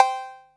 Cowbell; Percs

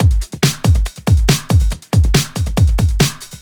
Loops BB 140, BreakBeats; Vengeance Samples

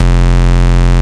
Root F, Bass

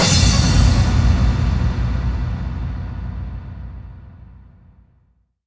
Hallkick; FX; Vengeance Samples

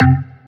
Synth Cuts; Vengeance Samples; VES1 Instruments

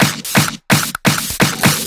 Fill Ins 128BPM; Vengeance Samples

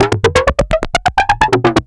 VFX2 Loop 128 BPM

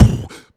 Beatbox Kick, Human Beatbox

